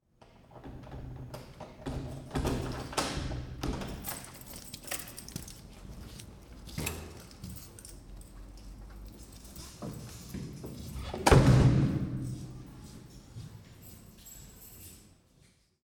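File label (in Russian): звук открытия входной двери звон ключей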